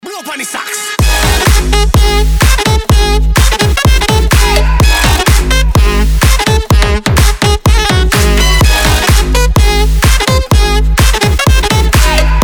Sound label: sax